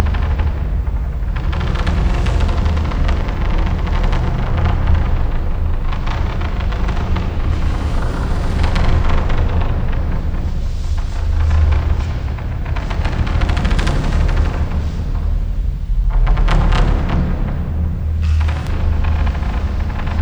Background Noice Spider Cellar; Granny; android

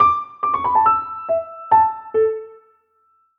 Stage Clear

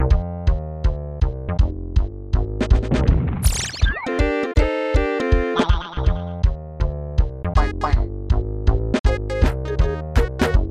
Level Music

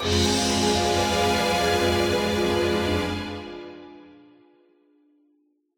Fail; Q T E Saving Altair; tintin reporter cigars of the pharaoh

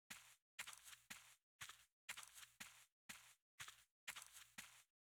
run Dirt and Grass loop; Munin

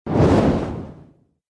Wizard Skill

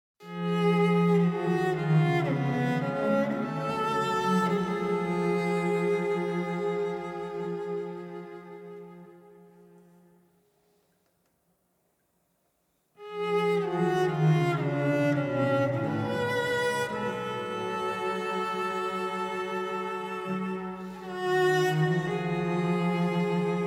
Exploration Duo cello

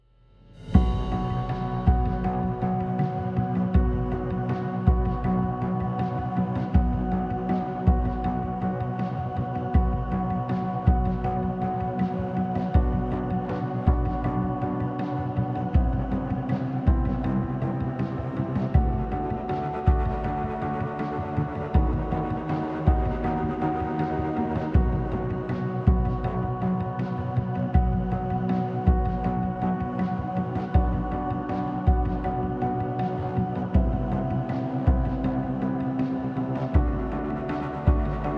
Forest Music Fight Section 3 Outro

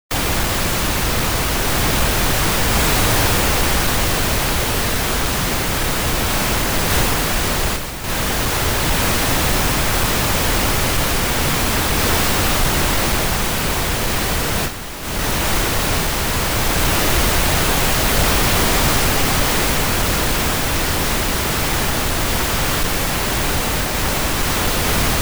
Radio Noise; Air 2236 A.D. Original Soundtrack

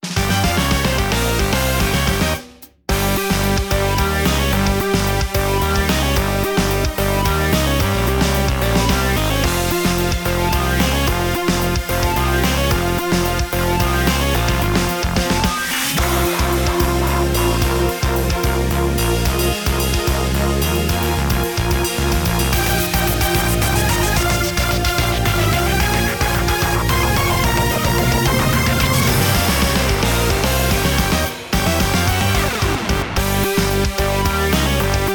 Jingle Pinch; Taiko no Tatsujin V Version; PS Vita

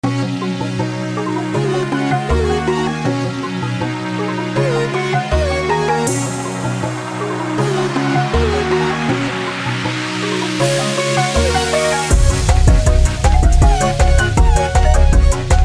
(Instrumental); Friday Night Funkin' Upside

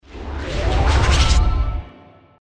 Rainbow Cake Explosion

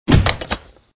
phone sound

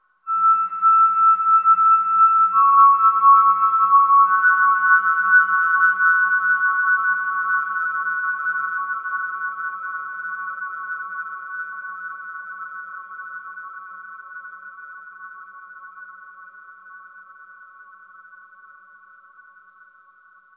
Overlay Tone

